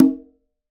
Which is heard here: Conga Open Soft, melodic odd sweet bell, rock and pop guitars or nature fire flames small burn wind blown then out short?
Conga Open Soft